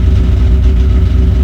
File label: sfx vol change; RCXtreme